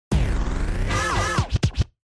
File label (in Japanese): tumazuku